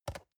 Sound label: storage place table soft, box; objekte